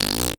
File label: Human Male Fart With Mouth